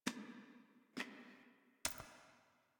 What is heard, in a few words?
Foley; Warframe; Zariman; Epilogue